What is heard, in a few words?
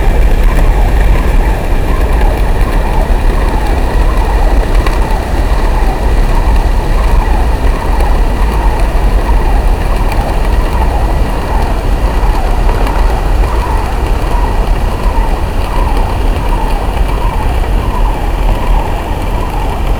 Underwater recording, Haro Strait